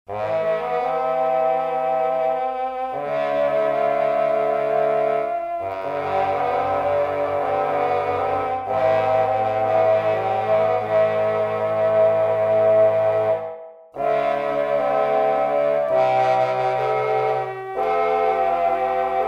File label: muted trombone